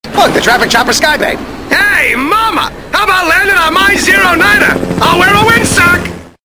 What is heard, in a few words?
Wind Sock